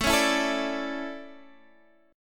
A Major Add 11th A, chord; Aadd11; strummed; JGuitar

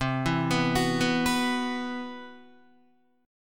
C Major Sharp 11th, Guitar, CM#11 chord; CM#11 arpeggiated; JGuitar

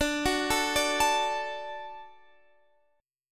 D Major D down, D Chord; arpeggiated

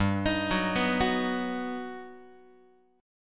G Augmented Major 7th, Guitar; G+M7 arpeggiated; JGuitar

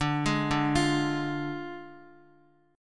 D Diminished D, Guitar; arpeggiated

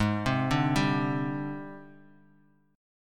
Ab Major 7th Flat 5th Ab, down, Bass Standard; AbM7b5 arpeggiated; JGuitar

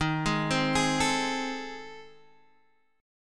A Minor Major 7th Flat 5th

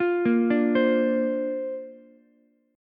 A sharp Major Add 9th, Ukulele C6; arpeggiated

